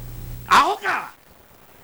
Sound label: switch